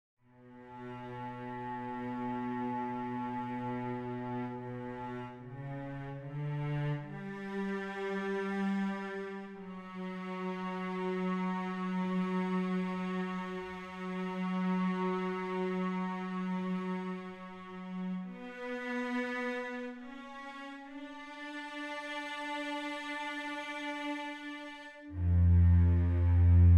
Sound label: Cellos